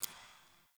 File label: Derelict Interior Water Drip; Ambience; Warframe; Orokin Derelict